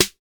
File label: Open Snares